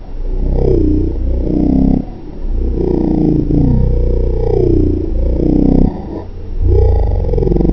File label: cave spider